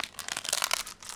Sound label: Tear Corn Husk; SFX Kit